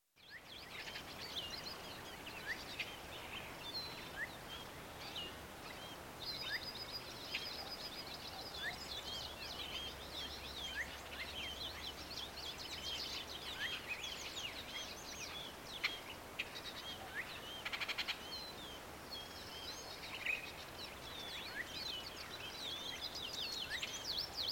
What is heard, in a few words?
Backgrounds Forest Ambianc; Soundstorm 1